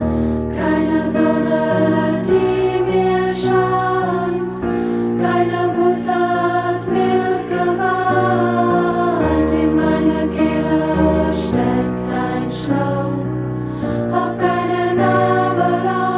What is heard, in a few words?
chorus cover